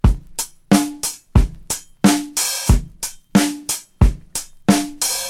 91 bpm drum groove b key, Free drum beat - kick tuned to the B note. Loudest frequency: 2552Hz; drum loops